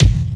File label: PERC FX